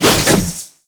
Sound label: Dodge Whoosh; Warframe; Wraith